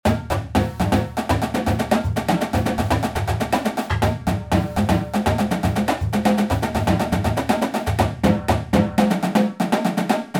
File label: Ax Tap